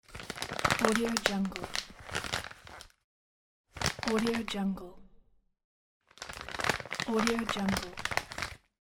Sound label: packaging